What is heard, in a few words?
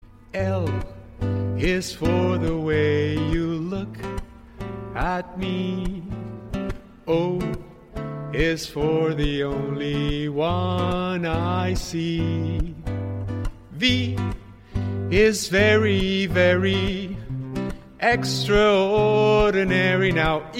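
Latin American Spanish singing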